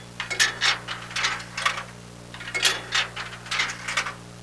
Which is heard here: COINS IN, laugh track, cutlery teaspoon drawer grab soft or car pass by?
COINS IN